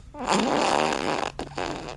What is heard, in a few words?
Chip Laugh